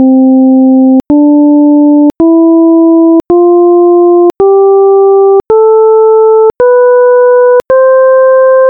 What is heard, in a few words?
equal scale